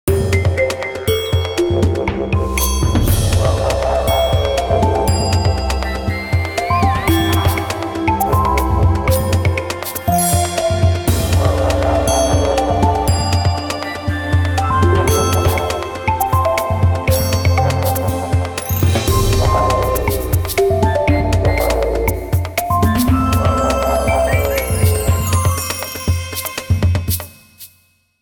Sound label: Magic Australia, ringtones; X